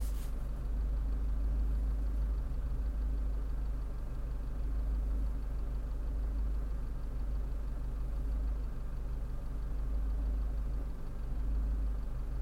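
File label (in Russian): Рено Логан на холостом ходу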